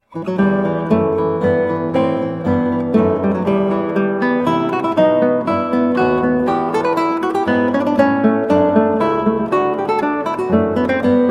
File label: Vivace, guitar, spoken; Partita 5 in E Minor TWV41 E1; Georg Philip Telemann